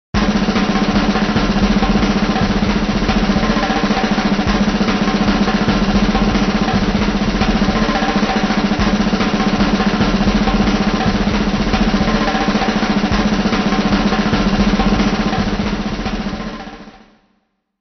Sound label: fills jazz